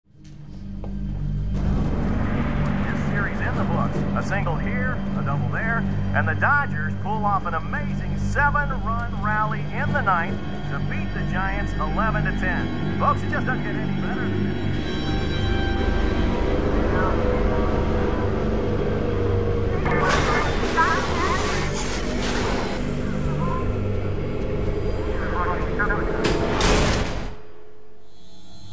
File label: break atmos; day